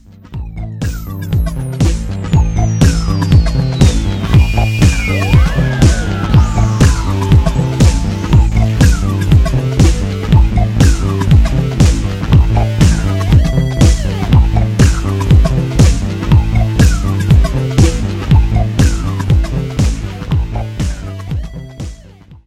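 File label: deep electronic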